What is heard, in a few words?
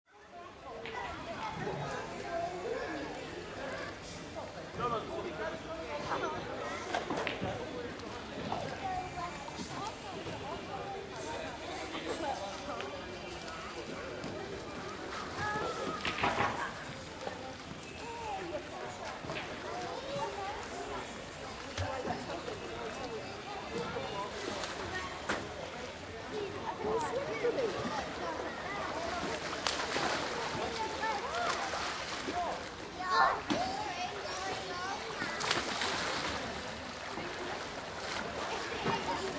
Crowd Hotel Resort Poolside, Children and adults hanging out; Crowd, Hotel Resort Poolside: Children and adults hanging out near swimming pool. Some people playing and splashing in water.; Lynne Music